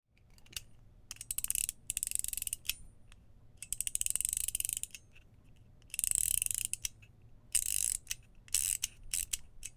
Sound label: Chattering Teeth Winding Up